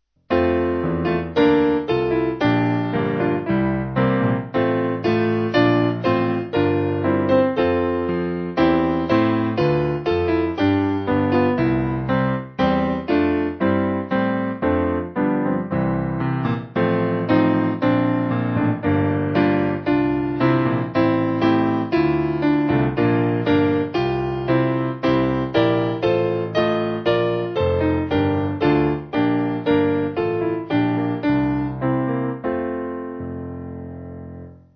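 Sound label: Piano, church music backing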